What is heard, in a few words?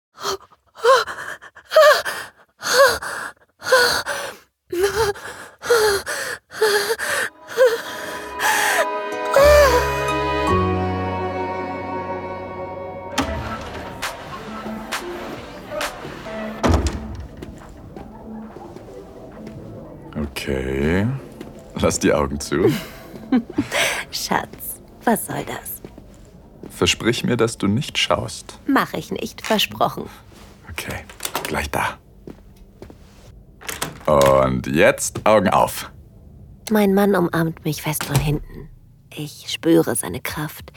female moaning; Renewed Vows; Wahre Liebe, wilde Lust: Unsere Nacht der Hingabe (Sinnliche Geschichten); Sinnliche Geschichten Podcast